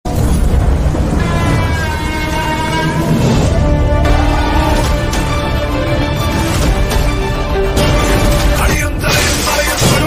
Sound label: kaduva bgm